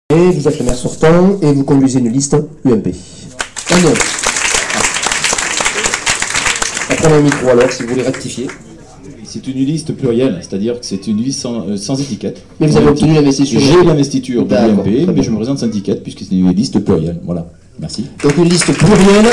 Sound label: Vocal